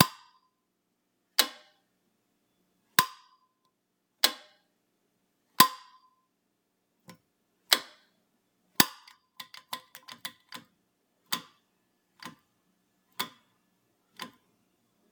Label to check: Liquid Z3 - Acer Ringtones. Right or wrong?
Wrong